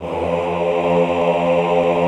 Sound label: AHH F1, Classical Choir; Best Service; Extended Classical Choir; AHH MIX